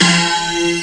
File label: vibra, cartoon sfx